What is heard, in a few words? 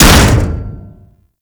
Hammer Impact Default